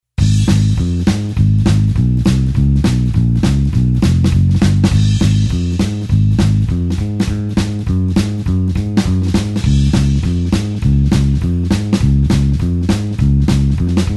blues bassline 4beat Ab

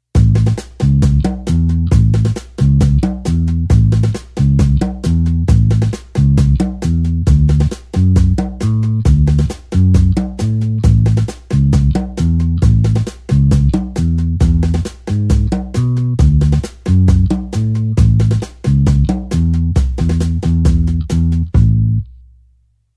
blues bassline 8beat A

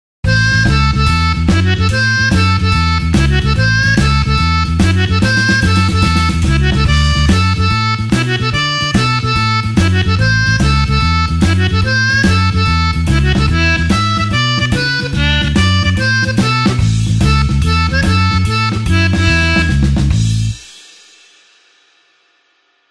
blues harp shuffle A

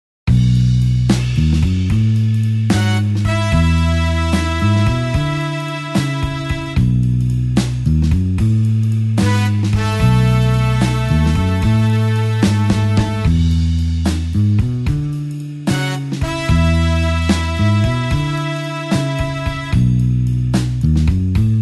blues horns slow B

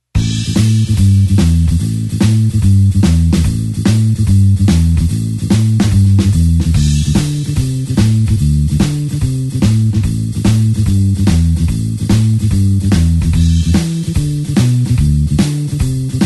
blues bassline shuffle A

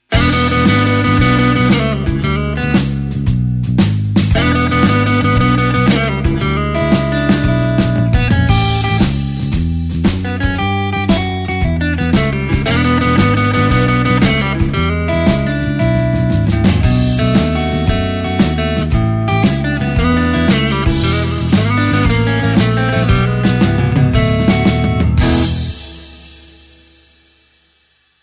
E shuffle classic

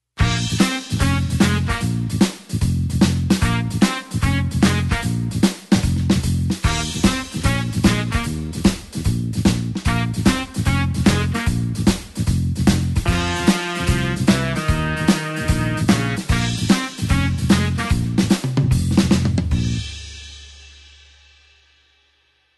blues horns shuffle Bb

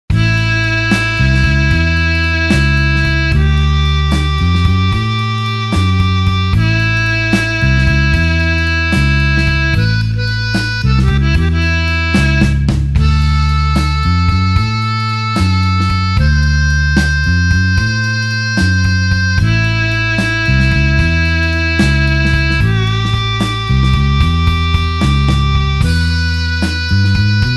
blues harp slow A tempo

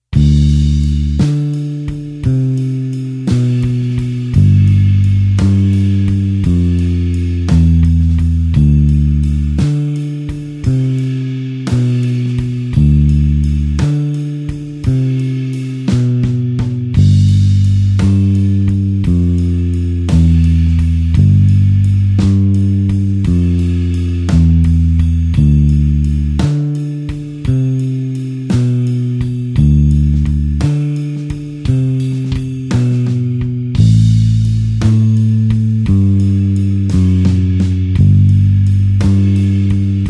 blues bassline slow D

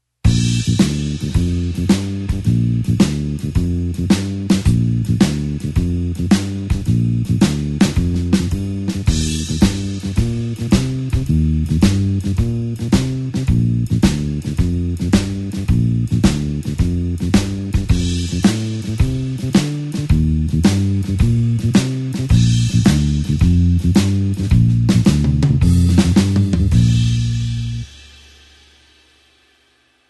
blues bassline shuffle Bb

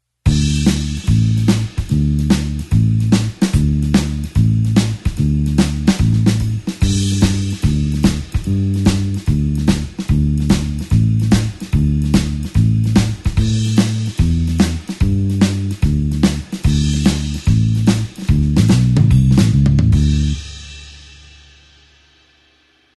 blues bassline shuffle D

